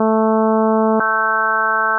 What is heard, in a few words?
Low High